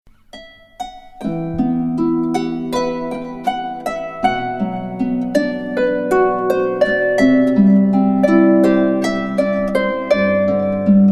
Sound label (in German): harfe